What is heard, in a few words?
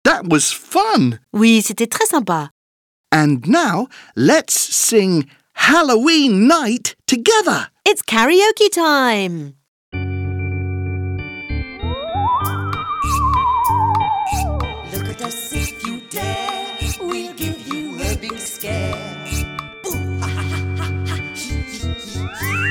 Let's sing Halloween night karaoké